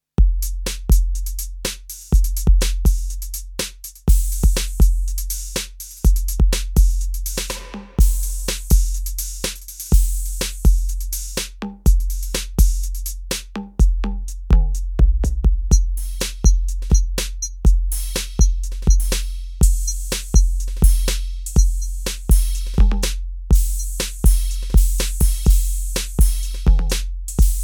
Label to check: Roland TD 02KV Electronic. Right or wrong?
Right